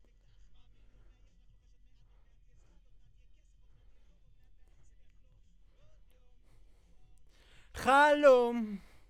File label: B. Vox